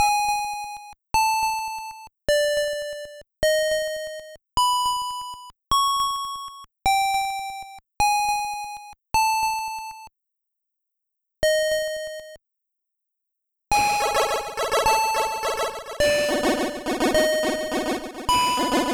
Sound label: 8Bit City Day loop; DPPt; Snowpoint